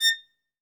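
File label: solo violin, strings; ILIO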